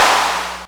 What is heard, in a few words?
Clapbox Noise; Ableton Foundation FreePacks